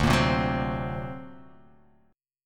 D Augmented 7th, guitar; D+7 strummed; JGuitar

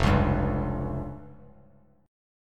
F Suspended 2nd Sharp 5th, Bass Standard; Fsus2#5 strummed; JGuitar

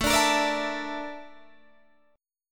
A sharp Major 7th Flat 5th, chord

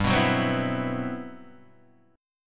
G Major 13th, Guitar DADGAD

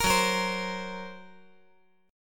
F sharp Major Flat 5th; F#Mb5 strummed

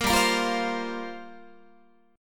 G Minor 11th G down Guitar; Gm11 strummed

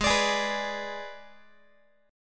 G sharp Diminished G sharp, down, Guitar; G#dim strummed; JGuitar

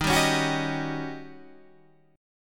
D sharp 7th Sharp 9th

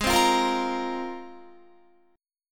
Ab 6th Add 9th, Guitar; Ab6add9 strummed; 16,15,15,15,17,17; 4E,4A,5D,5G,5C,6F

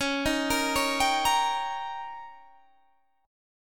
D sharp 7th C sharp, guitar

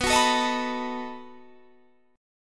B 7th B down Guitar Standard; B7 strummed; JGuitar

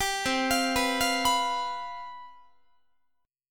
C Major 7th Suspended 4th, C, down, Guitar; arpeggiated; CM7sus4; 22,20,20,21,21,22; 4A,4E,5A,5D,5G#,6C#

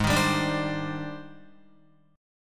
Ab Major Sharp 11th Ab down Guitar; AbM#11 strummed; JGuitar

